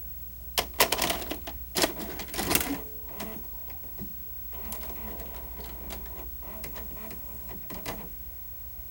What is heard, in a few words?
Sony SLV N50 VCR Insert